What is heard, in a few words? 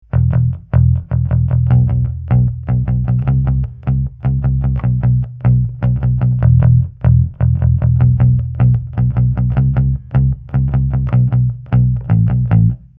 Nugget Bass